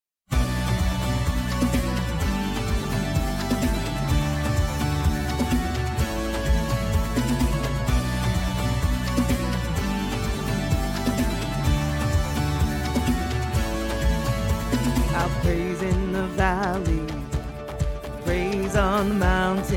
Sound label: Praise Full Choir Lead Tenor; summer choir